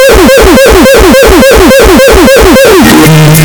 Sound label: oooooooof